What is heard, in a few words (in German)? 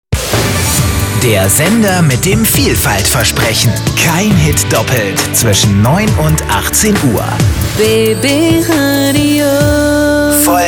Jingle; BB RADIO